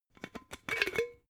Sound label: glass bottle massive open cap